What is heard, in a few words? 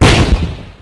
throw explosion; HEDZReloaded; abilities; Throw projectile